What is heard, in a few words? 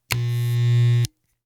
electric shaver short on off switch click